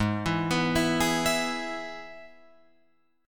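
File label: Ab Minor Sharp 5th chord, Guitar, Open F tuning; arpeggiated; 8,11,11,11,11,11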